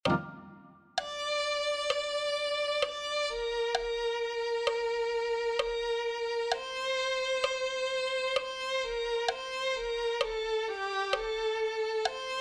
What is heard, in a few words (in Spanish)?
llevame a la roca violin